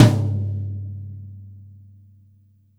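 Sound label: Jazz kit tom, Drums Acoustic; AKAI; JAZZKIT 2; 90s sample CD; USB Soundscan vol.10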